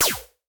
Space Snare

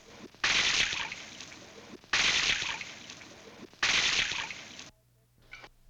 Water Splashes

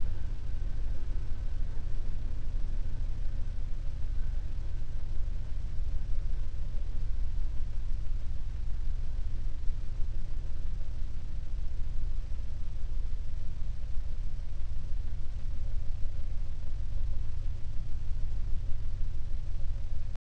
Rumbling

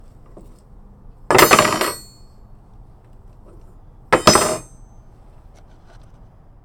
Metal Droped

